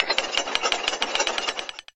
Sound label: Back Elevator; peace death 2017 pc